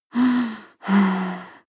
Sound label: breath female moaning breath